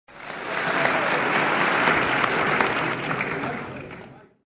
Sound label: applause